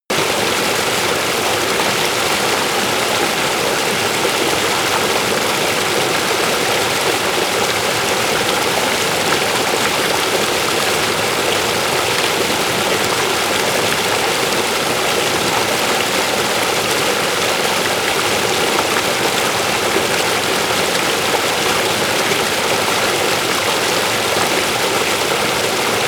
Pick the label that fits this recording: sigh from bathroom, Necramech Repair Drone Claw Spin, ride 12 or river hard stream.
river hard stream